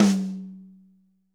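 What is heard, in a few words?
high tom; drum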